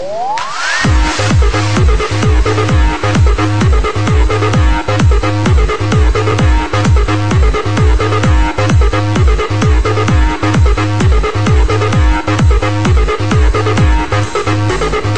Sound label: Electro